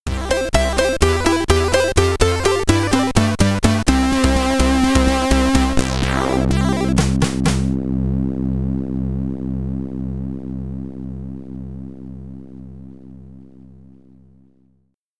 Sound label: You Found The Potion!, SID Stereo